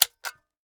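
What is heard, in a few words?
eject empty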